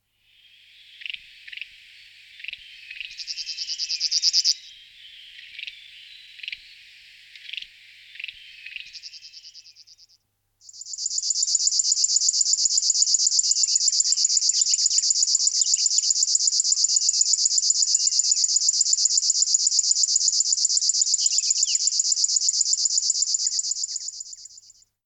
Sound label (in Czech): Cvrcilka ricni Locustella fluviatilis